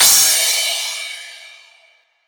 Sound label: Crash; Vengeance Samples; VES1 Cymbals